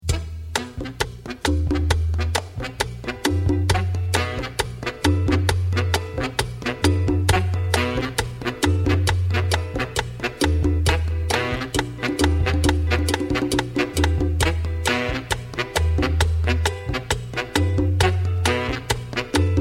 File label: loop long mono